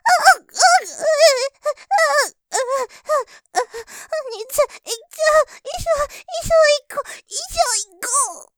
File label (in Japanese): 絶頂スパート; 絶頂！体をビクンビクン; 一緒にいこっ！！; ループで楽しむ！シェフの気まぐれ抜き抜きコース; モノラル